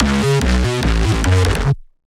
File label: Bass